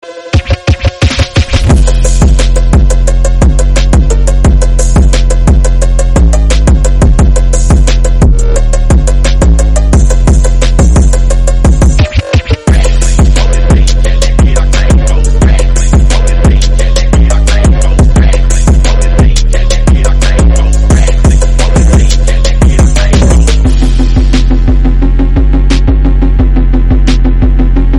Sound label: phonk